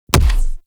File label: Quick Draw Punch; weapons; Warframe; The New War; Omicrus